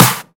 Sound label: short clap one shot b key